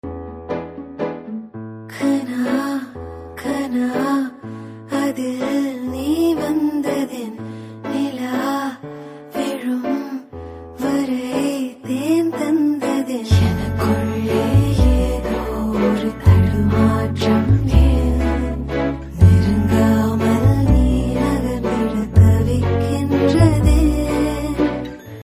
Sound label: Ringtone